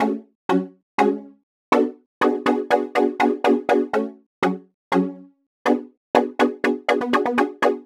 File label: Pizzicato Synth; Sonic Foundry (Sony Creative Software); Club Joints and Street Anthems; Razor Edge